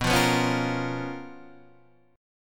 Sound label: C sharp 13th B